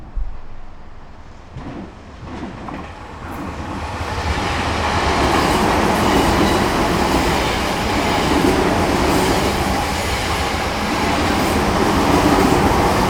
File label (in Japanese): 上り電車通過。; 録音したファイルを、本体でノーマライズしました。; ZOOM ヘアリーウィンドスクリーン WSU-1; H5studio 内蔵マイク